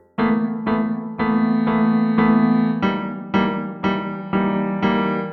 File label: Hardboiled Piano 90 E, Loops n Lines, 90bpm